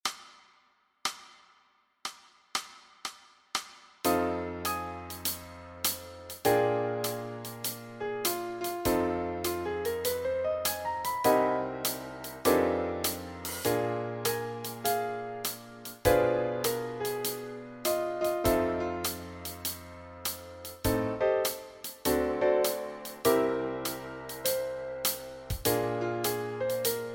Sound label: Backing Track, walking bass